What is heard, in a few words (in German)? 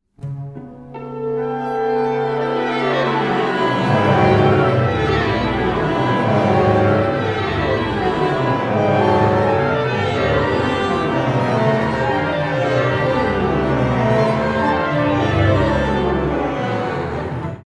treppenmusik trame